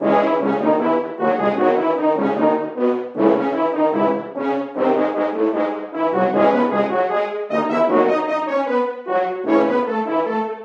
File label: Horn; Sanctuary Score BT3; The Bard's Tale Remastered Trilogy